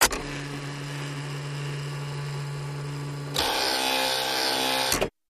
Typewriter Elect; typewriters

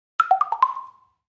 Percus Plastic Block, percussion blocks

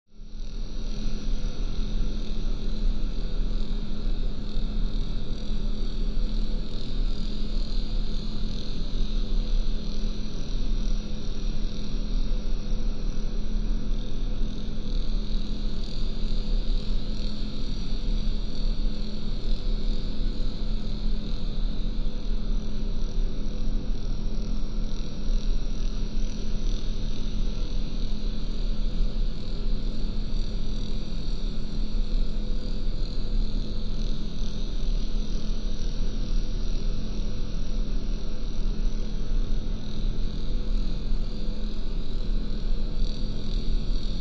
Ambience Cargo Bay

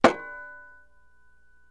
Axe Hits Shield

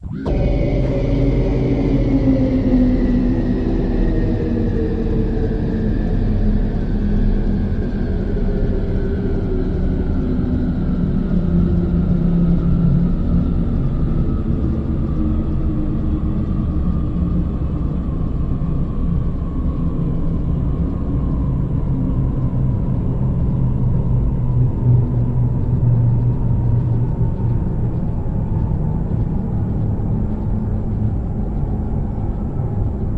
Fan Martain Runs Down To Stop Too Short; Fans Big

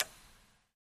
Robothead Mechanism Small Hit; gears

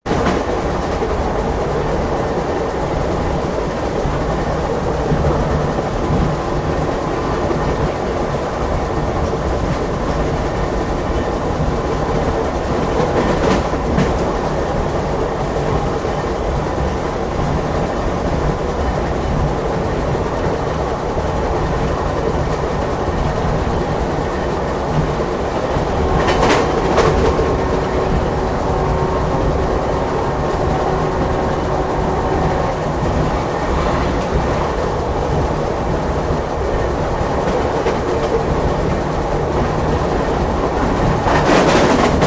Steam Train Boxcar, steam train

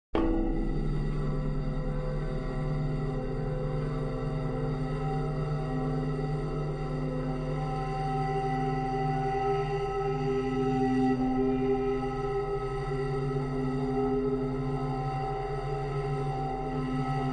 Oven Rack Bow, bowed metal

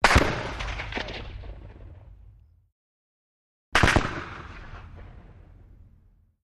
.50 Caliber: Single Shot, Double Shot

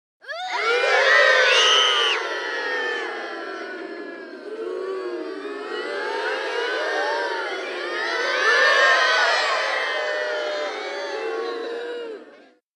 Kids Booing Crowd

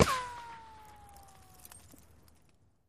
Bullet Ricochet Sin

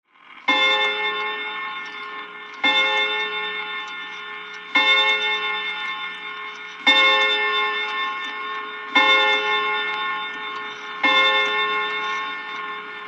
Mantle Clock Strike

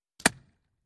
Bullet Impacts Water

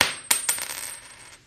Coin Drop Wooden Floo

